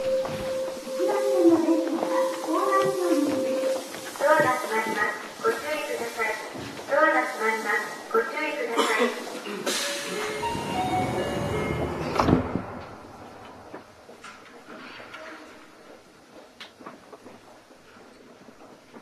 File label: vvvf, mitsubishi